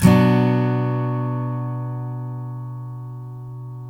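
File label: steel B minor down, CHORDS STEEL, Guitars; Spectrasonics; Hans Zimmer Guitars VOL-1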